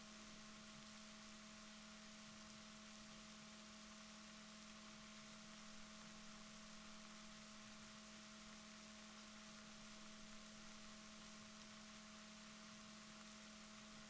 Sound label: rorquals positif, Balaenoptera Physalus